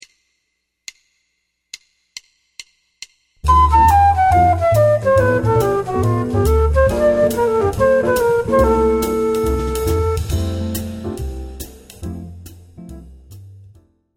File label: bebop scale